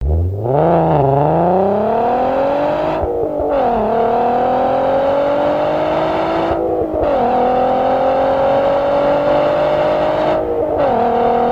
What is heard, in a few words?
r32 first gear launch